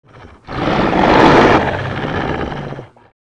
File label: Puppet Wolf Idle; FlyForInsanity